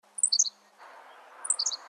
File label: SPARROW HENSLOW S; species/birds; wbba